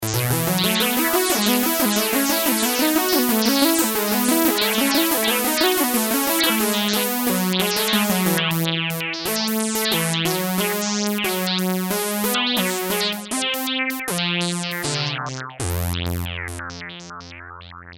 synth analog, emu carnaval

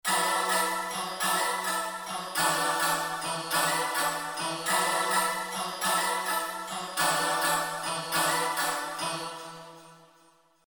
synth o choir, Yamaha TG-500; Synth: voice

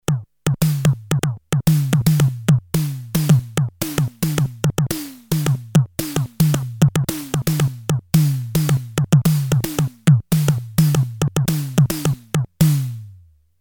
drum, yamaha dx 5; drum split

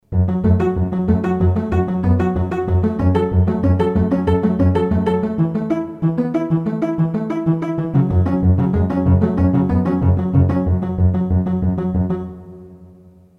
strings, Roland MT-32

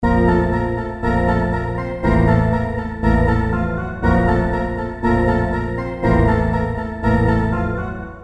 SYNTH doom bells, Roland JD800

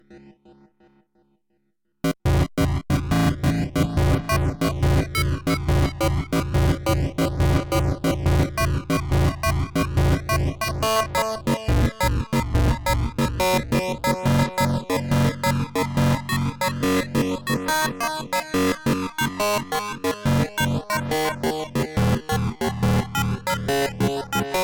synth 4bits, access virus

